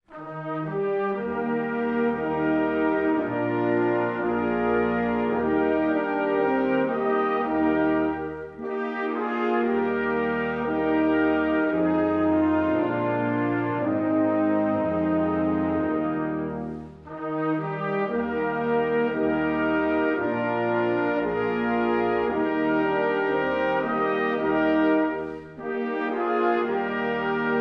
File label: marines hymn slow version; marine corps birthdhay ball